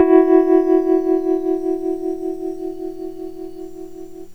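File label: EP vib E3 right; E-MU Formula 4000 Series Vol. 1; Hip Hop Nation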